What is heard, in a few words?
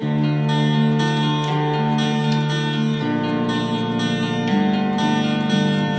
Mid Section Music; ether one redux gamerip 2015